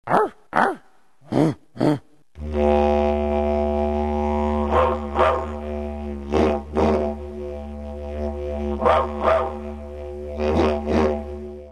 vocal grunt, didgeridoo, screams and grunts; Try doing this into the didge as you vibrate your lips. Arrrh….Arrrrh Uhgh…Uhgh; Timeless Productions; didgeridoo tutorial

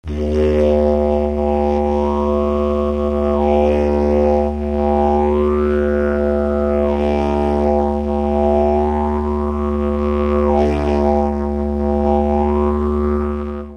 cheek mod, didgeridoo; Think of shaping the sounds of “ooooo” and “errrr…”; change the shape of the mouth cavity and therefore the tonal characteristics of the sound; Timeless Productions; didgeridoo tutorial

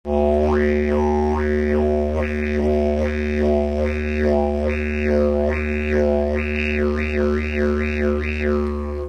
fast ooee, didgeridoo; Here’s what happens when we take the same modulation and play it much faster.; Timeless Productions; didgeridoo tutorial

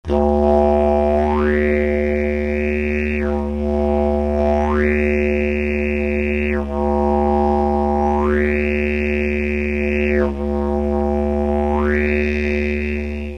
oooeee, didgeridoo; Timeless Productions; didgeridoo tutorial

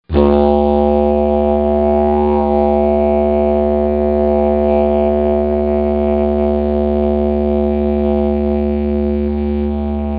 drone, didgeridoo; droning tone; Controlling The Tone; Creating this droning tone is a very important first step and it is the foundation for everything else you will learn in this tutorial.; Timeless Productions

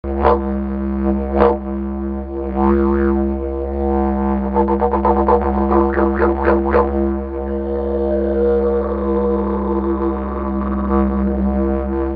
vocals, didgeridoo; Timeless Productions; didgeridoo tutorial

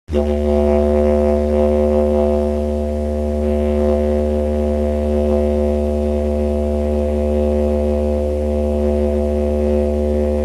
long drone, didgeridoo; soft breath technique that will allow you to sustain a clear note for as long as possible; Once you can play a clear note for more than a few seconds you can experiment with modulat; Timeless Productions; didgeridoo tutorial

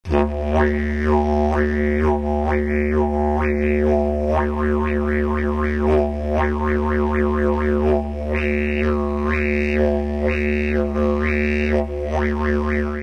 ooee mod, didgeridoo; powerful harmonics and overtones to the basic didge drone; You will find these very useful later in our exploration of rhythmical didgeridoo styles.; Timeless Productions; didgeridoo tutorial

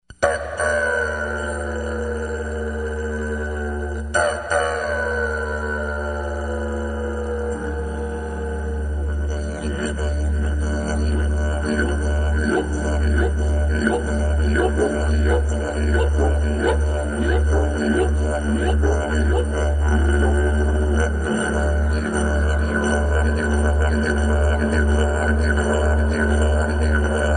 Duet In D

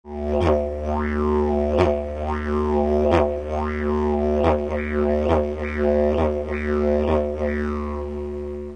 kangaroo, didgeridoo; my interpretation is created by shaping the sound of too-eee-aaahh. Really push with the diaphragm to accentuate the “too” part of the sound.; Timeless Productions; didgeridoo tutorial

